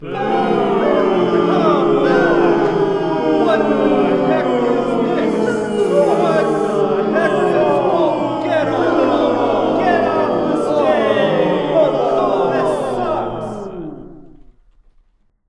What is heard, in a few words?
Booing